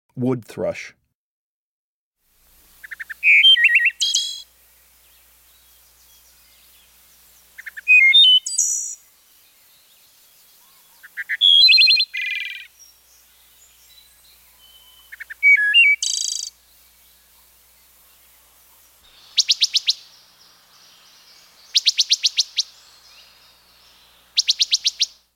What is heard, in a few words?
Wood Thrush, Birds of the Chicago Region